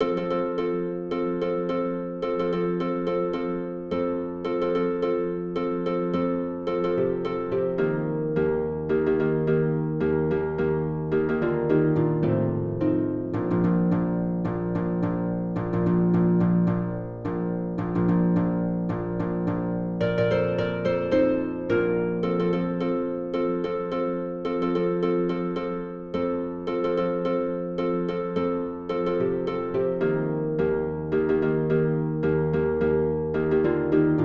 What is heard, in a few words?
harp; rrabula harm